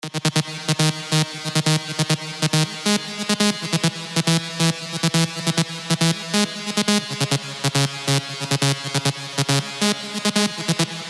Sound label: Trance Gates; Falling Up; Revealed Serum 2 Trance Gates Vol. 1